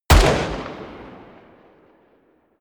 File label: revolver distant shot; schusswaffen